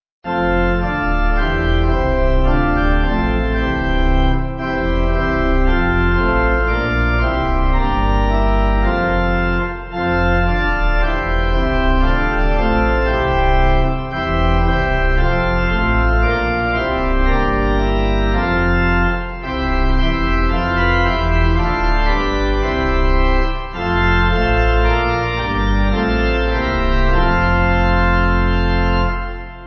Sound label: Thee O Jesus Ill, Pipe